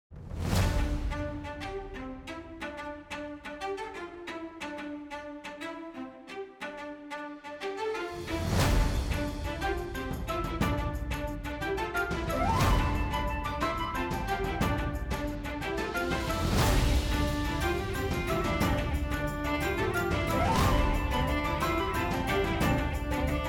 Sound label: pirate tavern